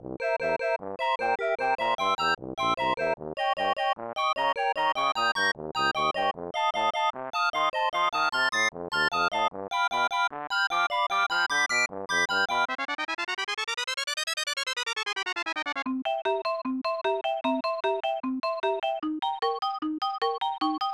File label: Mouse Maze Level Music; fun n games 1994 snes